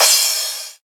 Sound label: Crash, Drums Cymbal